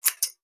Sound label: Large scissors snip